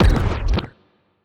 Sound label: anti fire rate laser hit, weapons; biogenesis